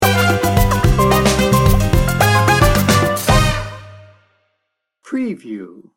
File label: salsa libertad sting bumper